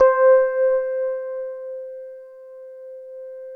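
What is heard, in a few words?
FM electric piano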